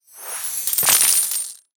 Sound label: SFX Int Buy Coins; mighty party 2017 gamerip pc ios android